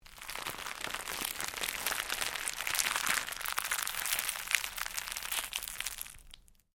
Cellophane crinkle

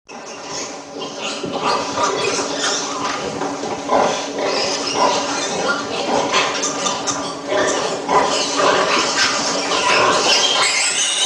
Farm Piglets Feeding From Mother Pigs

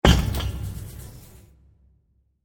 Metal Impact With Electric Wire; Burst Sound Effects; Bursts (Sound Effects:Impacts)

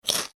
human eat crunch rye crispbread; Human Bite/Crunch Rye Crisp Bread; Eating; McKinney Sound

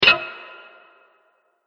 Metal Clunk Sound, impact; Miscellaneous Impact Sound Effects

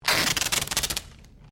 Sticky Tape Rip Pull; common tape; Offices

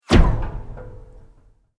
Sweep Mechanical Fast; Created sweeps, swooshes and other movement effects; Sweeper; Sweepers (Sound Effects:Sound Design); SoundEffects-Foundation4

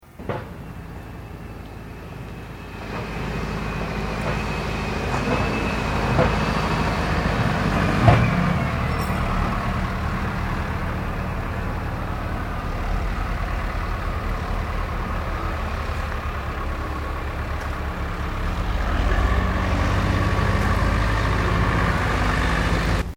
TRACTOR EARTHMOVER MANEAUVERING; Vehicles; Tractors

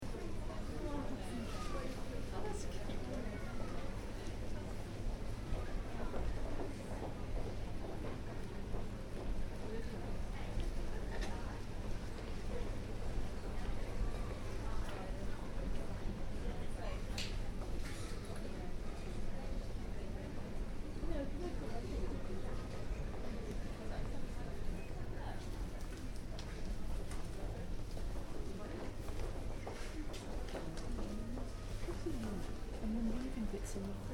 Department Store, Interior: Ambience; Stores and Malls; Backgrounds; Stuart Duffield

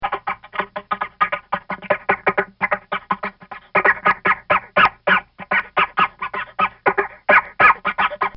Cartoon Sound Effects Stutters; Cartoon Sound Design

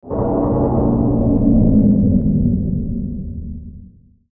Sweeper Dark Slow Flyby; Blastwave; Production Element Imaging Element Accent Transition

